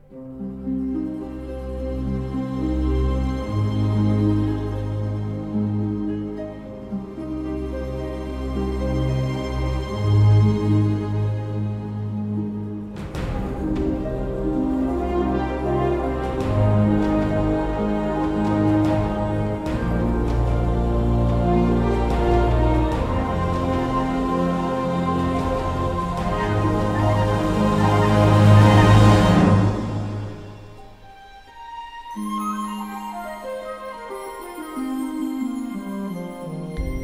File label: music wizard; Panda